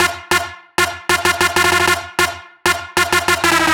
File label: Stinger F 128 bpm, Synth; Loops; Tonal; Ableton Live 12 Lite; Core Library